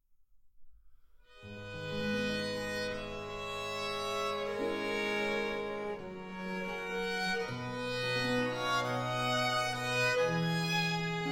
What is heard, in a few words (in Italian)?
Serenata Con Violini (Sinfonia)